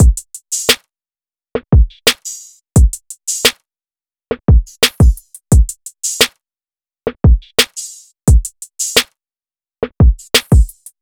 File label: Drum Loop 87 BPM; No Reason; Cymatics; Vibes II Drum Loop Collection